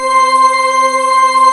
CHURCH, JV-1080; Syntec; Wall of Sounds

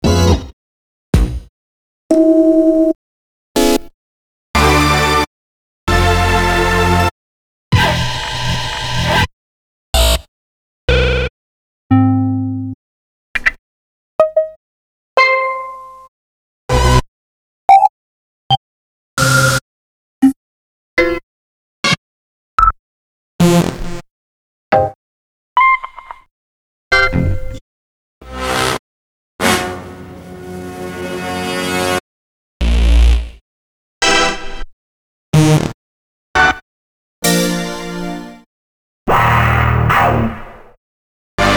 breaks, Jungle; Zero-G Jungle Frenzy 1

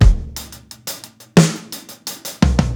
87 HALF TIME 16THS, drums; LOOPS; Basement drums vol 2

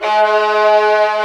STR VIOLAS, strings; Roland - LA Composer vol. 1

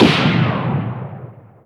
Gun Shot; Sound Blaster

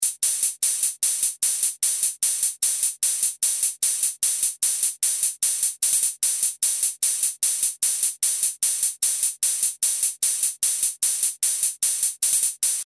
Hiss Hats; Industrial

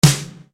POWER SNARE, Yamaha RY-30

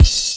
Kick, Virtual Kicks; Zero-G Jungle Warfare 1, 2 & 3; Percussion Hits; Drum Loop Hits

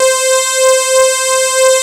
MG SYNTHS; Pro-Rec - Synthsonic; JV ANALOG

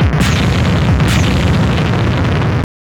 WAR FX

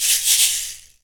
shaker, shaker loops; Roland LCDP14 Africa VOL-2

